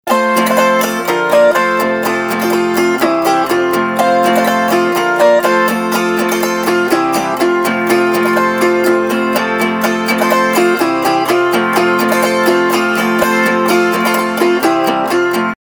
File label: Autoharp